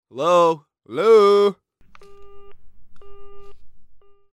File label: phone